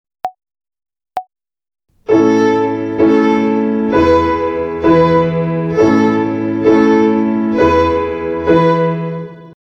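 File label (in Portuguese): Ginástica para o dedo 1 Corda LÁ Ternária, Violino; Violino para os Pequeninos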